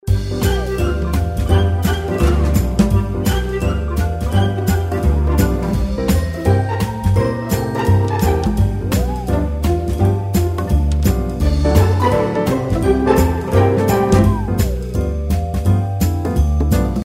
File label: Background; Candy Bona